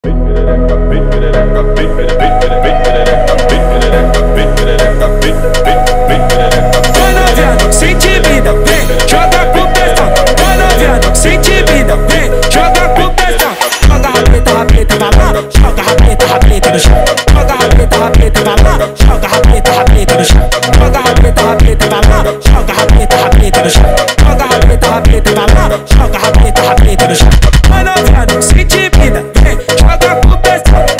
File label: funk sped up